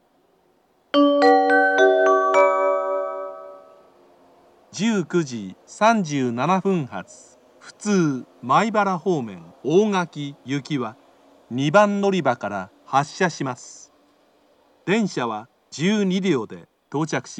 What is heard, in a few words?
local, kyoto station; ogaki